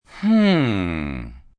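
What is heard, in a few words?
Hmm Pensive male, Reaction, male; Exclamation; VoiceBox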